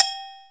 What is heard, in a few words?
Roland R-8 agogo; Internal